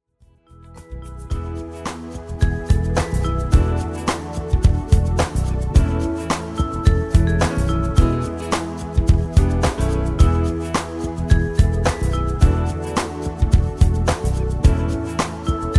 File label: sunshine corporate